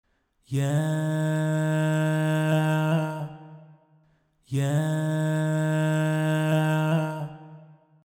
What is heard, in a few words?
AutoTuned vocal